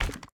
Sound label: Joystick Grab; Deathrun